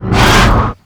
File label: pinky attack melee, monsters vocalizations; DoomRTX